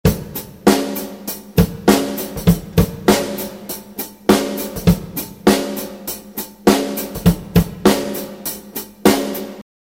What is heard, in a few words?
drumloop